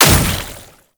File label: Oraxia Brood Projectile Impact; Warframe